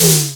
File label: Simmons SD-8